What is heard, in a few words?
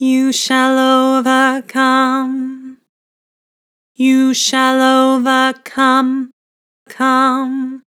Vibrato, Vocals; You shal overcome; Harmor Vocals